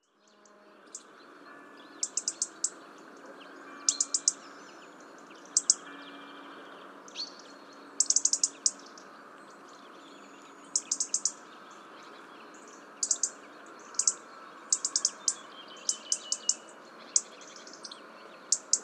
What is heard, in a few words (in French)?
rouge gorge